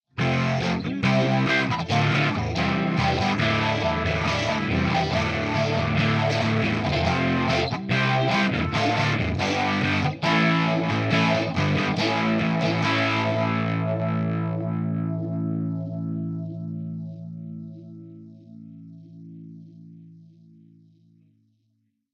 mxr srlp chords modern, ampsims

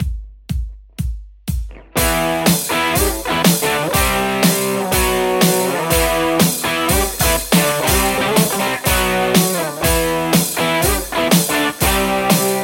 white tele; mercuriall

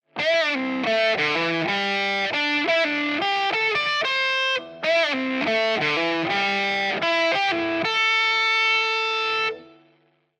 808 solo modern, amp sims; tse

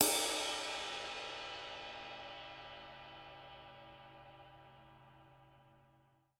drums ride; brut

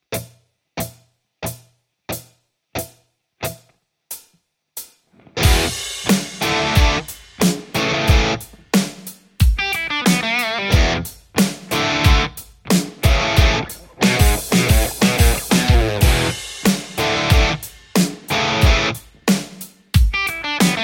tele le456; poulin

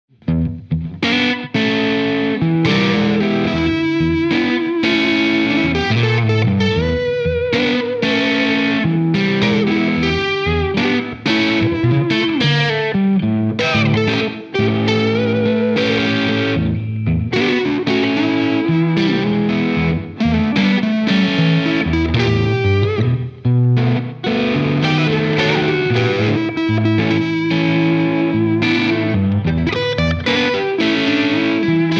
blues strat sgear2; scuffham

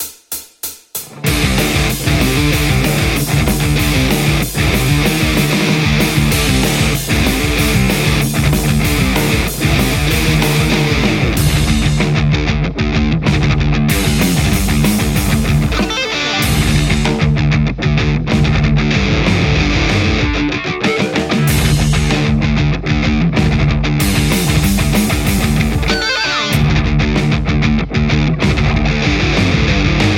metal Les Paul; nickcrow; wagnersharp

